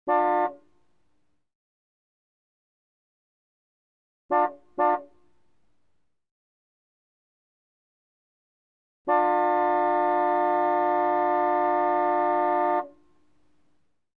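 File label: Car horn sound, klaxon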